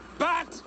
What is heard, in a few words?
Bat